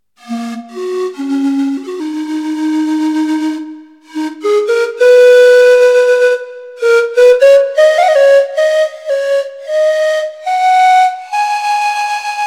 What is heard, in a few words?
FLute; El Condor Pan